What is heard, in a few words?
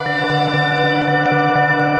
Synthesizer Middle C Notes, Lead; multisampled; synth keys